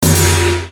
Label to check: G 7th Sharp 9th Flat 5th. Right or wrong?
Wrong